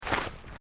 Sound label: FOOTSTEPS SNEAKERS GRASS STEP; Footstep Foley; Human